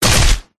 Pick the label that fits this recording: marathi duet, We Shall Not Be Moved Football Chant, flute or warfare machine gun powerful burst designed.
warfare machine gun powerful burst designed